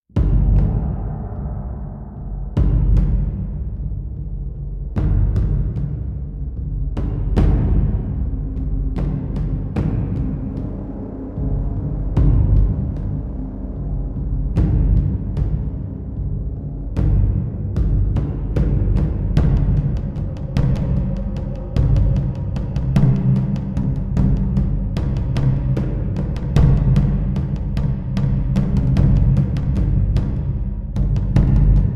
background beast; Armies of Exigo

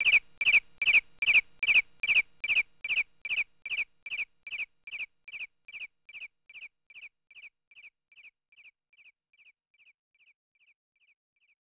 Piyo! Piyo! (Motor Initialize); Scud Hammer

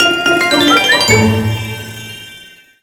Key Get Great

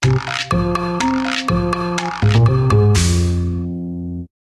Sharpen The Pencil (Success); I Spy Spooky Mansion; Wii

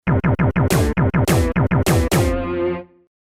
Race Fanfare; Super Go Kart Racing 2023

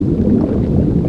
Sub Move; Character Sub Move

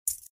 Key Pick Up; Granny Chapter Two

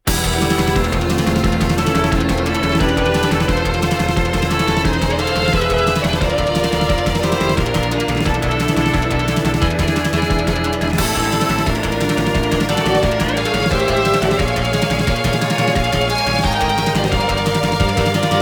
BGM lastboss; Town of Night

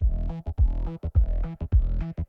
Bounce; Bounce-Intro

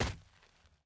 dirt land

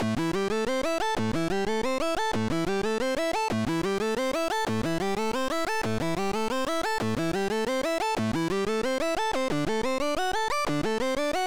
Poly Arp; super crush k.o.

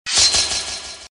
Sfx Baphomet Dead

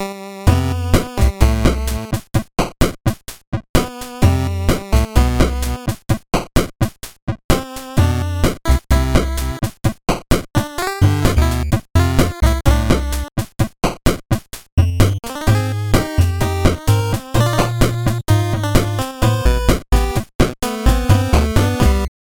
game music

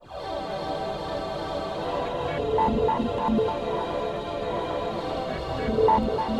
Kyoto E Ambient

